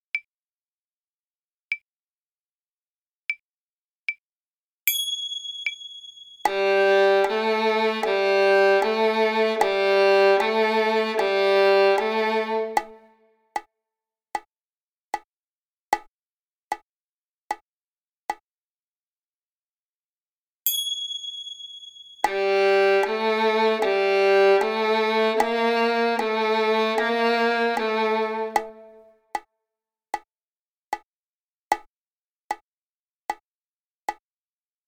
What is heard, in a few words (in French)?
Jeu du perroquet sur la corde de Sol Violon